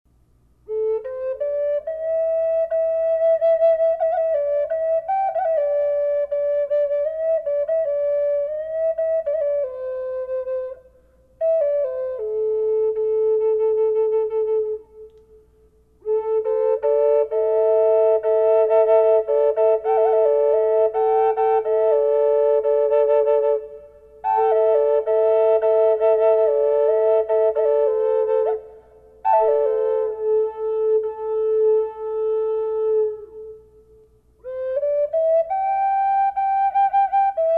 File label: double flute reverb a minor, Drone Flutes; Sample #2 with a light reverb; Key of A minor; Heartsong Concert Drone and Double Flutes; Sedona